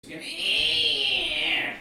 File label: Troll screech